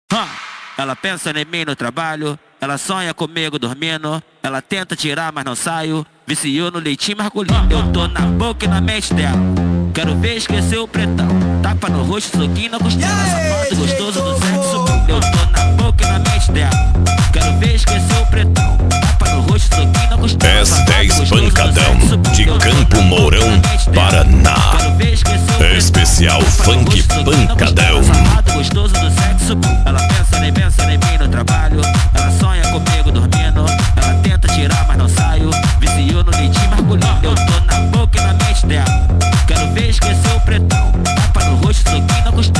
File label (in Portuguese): pancadao funk